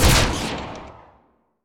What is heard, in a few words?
Sci-Fi Weapons Machine Gun Single Shot